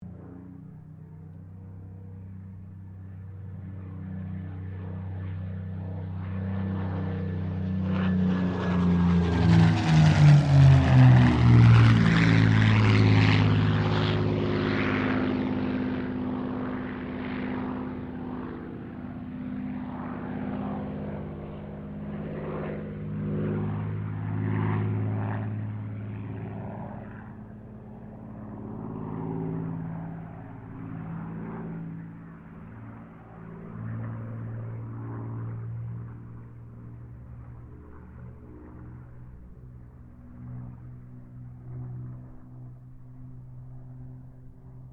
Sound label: AIRCRAFT SUPERMARINE SPITFIRE MK-XVI PASS